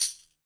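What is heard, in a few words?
Tambourine Acoustic Crush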